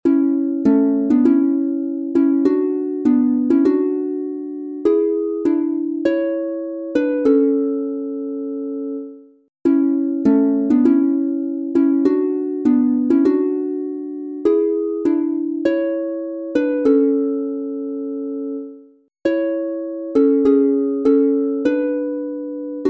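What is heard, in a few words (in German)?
A Dur 2 stimmig instrumental, Harfe; Seemannslieder; Heute an Bord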